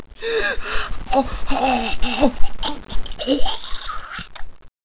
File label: death